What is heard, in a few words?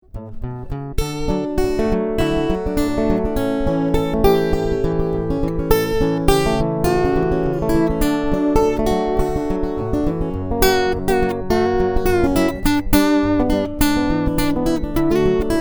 picking